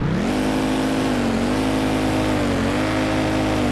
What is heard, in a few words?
gokart throttle off; freeman; vehicles